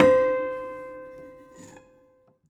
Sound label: Prepared Piano; Battery 3 Sample Kits